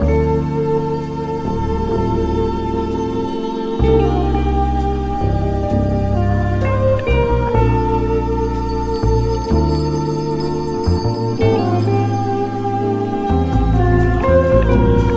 guitar

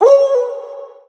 woodle SUNG; ooh ahh; ho hoo woo

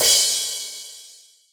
real closed hats

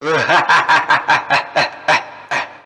laugh kung fu; ooh ahh

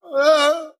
"woah" SUNG; ooh ahh

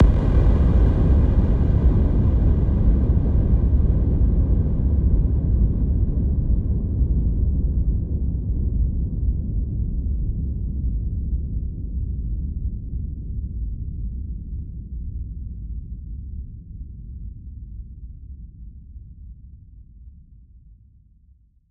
electric explosion; Crash Bang Boom

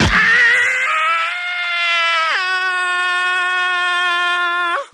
scream long

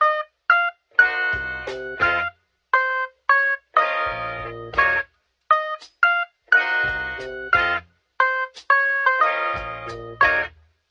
keys; Phonky

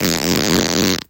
fart short

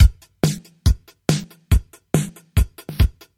simplistic beats, Drum loops Electro

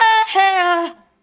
"aheh"; Voice; ooh ahh

